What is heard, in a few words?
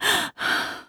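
Realistic Fatigue Voice, female; oblivion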